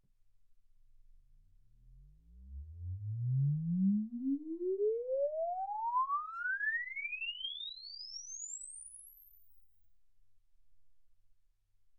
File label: exp swept sinus 10Hz 24kHz